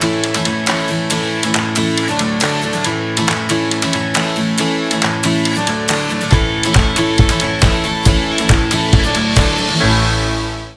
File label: Folk